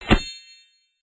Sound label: pick; Mr. Bean - Backyard Junk